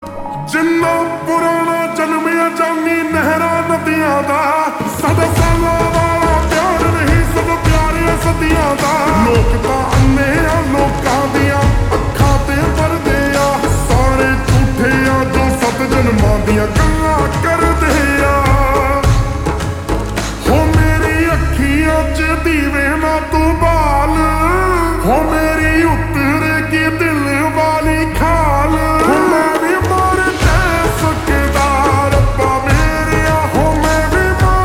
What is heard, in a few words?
Ringtone Slowed Reverb